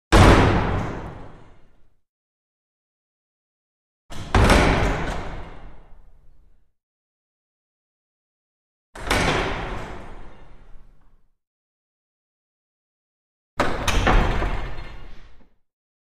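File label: Small Wooden Chapel Door, crash slams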